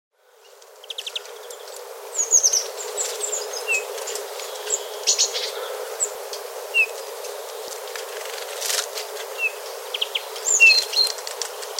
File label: pyrrhula scand; domherre